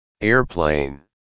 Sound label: airplane